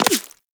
Bullet Impact Flesh; Warframe

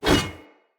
One Handed Axe Elite Whoosh; Weapons; Enemy; Axes; Sinecure One Handed Axe Elite

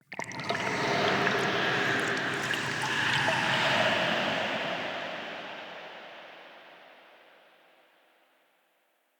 Soul Plane Trippy Breath; Ambience

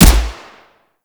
Grakata Shot, Weapons; Warframe; Void Trader

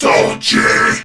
Surprised; Warframe; Battle; CorpusCrewmen; CrewmanC

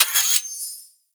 Rapier Equip; Warframe; Weapons; Swords

